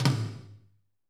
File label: TOM M R, Drumscapes Roland